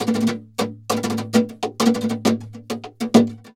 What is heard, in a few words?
flamenco guitar, duende fx; ProSamples vol.46 - Flamenco